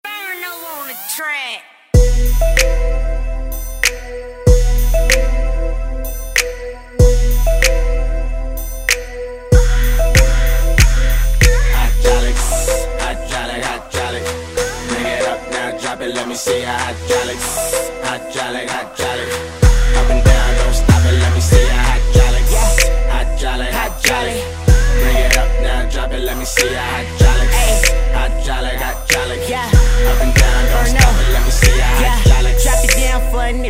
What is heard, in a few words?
hydraulic clean